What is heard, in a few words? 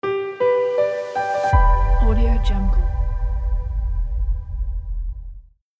logo piano romantic wedding